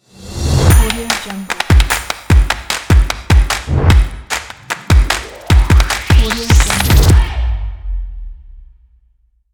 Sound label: dynamic percussion and upbeat claps ident